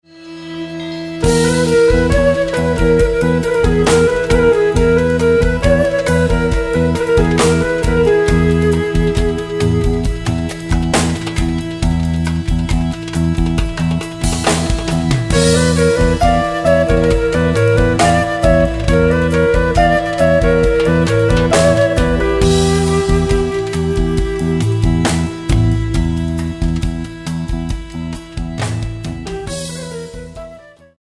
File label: Jazz